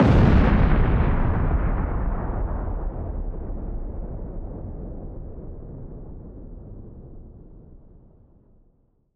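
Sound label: explosion extra norm deep; weapons; starruler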